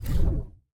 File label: Hand Paddle Release Med